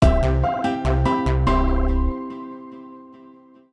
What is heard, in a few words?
MUSIC EFFECT Platform Positive Slow; stereo; Universal Sound FX; MUSIC EFFECTS